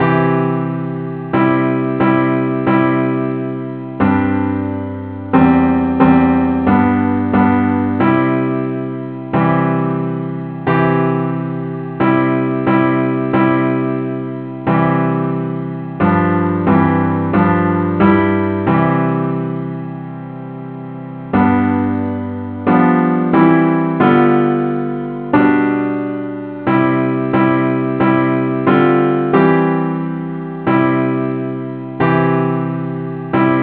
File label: parts only key C